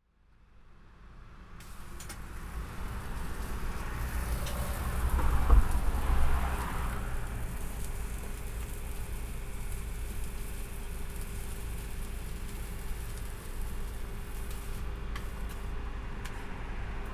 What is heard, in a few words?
Roadside snack bar Battersea